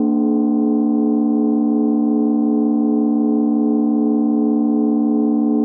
Drone Static; Drums & Drones

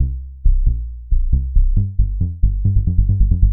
Soft Sub 136 C; musicradar; bass boutique

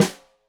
Snare Stereo, Drums; Deeply Sampled Drums; SMDrum

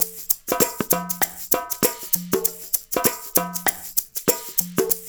percussion loop

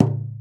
LOG, INDIAN PERC; Big Fish Audio - Didgeridoo and Other Primitive Instruments

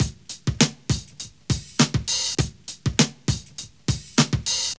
Drum Loops; Big Fish Audio; Prosonus 500 Drum Loops

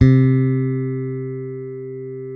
bass, sustain; Sampleheads; Will Lee Bass Library

